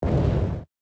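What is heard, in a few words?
ship to strand; shipcharge; Pirates Of The Caribbean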